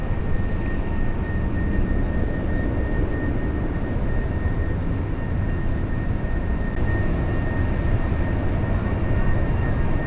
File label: SP Edificio Italia, Brasilien